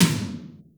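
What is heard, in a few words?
processed tom, drums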